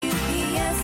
UPS Text

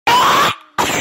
choke on cookie asmr

